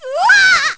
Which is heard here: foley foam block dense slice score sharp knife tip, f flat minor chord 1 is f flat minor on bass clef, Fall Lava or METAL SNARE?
Fall Lava